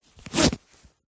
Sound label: foley clothing jeans denim pants trousers fly zipper; zapsplat